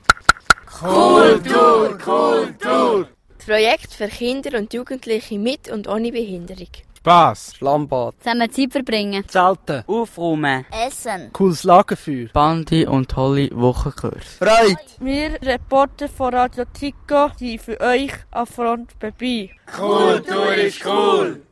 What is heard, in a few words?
Jingle; Cooltour14